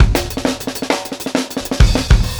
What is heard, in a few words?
Bon Bon Kit 1-Bar Fill, Drum n Bass; KJ Sawka - Drum n Bass & Beyond; Beats and Percussion